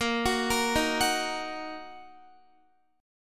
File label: Bb Suspended 4th Sharp 5th, Bb, down, Guitar; arpeggiated; tuning 4E,4A,5D,5G,5C,6F